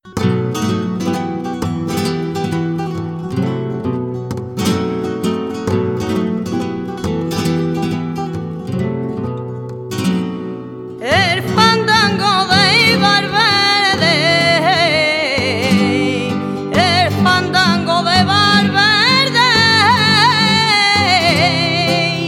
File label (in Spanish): Fandango, flamenco; de Valverde